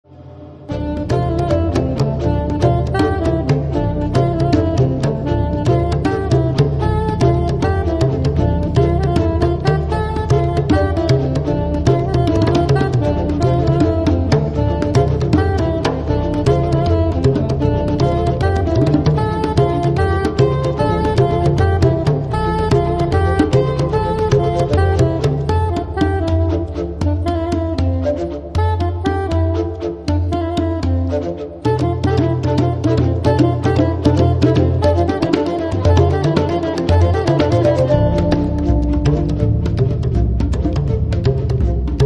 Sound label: raga blues